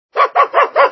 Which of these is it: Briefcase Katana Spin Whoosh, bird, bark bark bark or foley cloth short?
bark bark bark